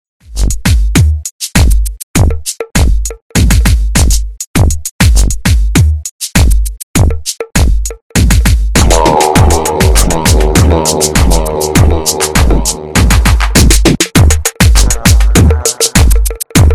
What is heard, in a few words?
instrumental